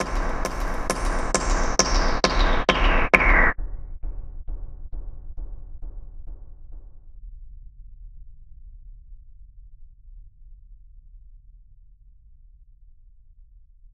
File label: Control Filter, Cinematic Metal Impacts